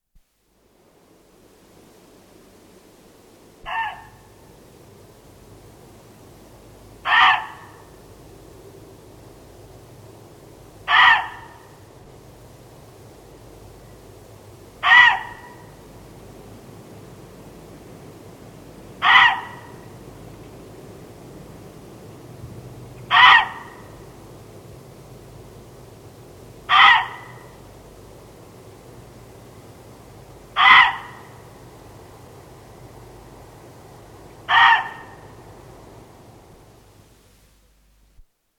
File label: Muntjac Deer